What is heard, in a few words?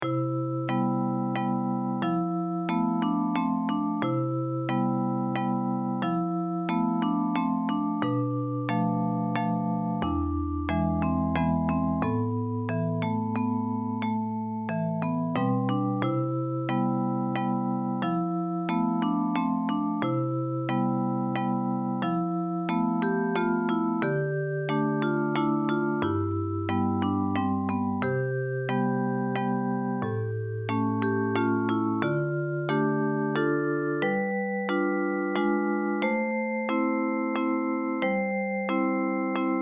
simple waltz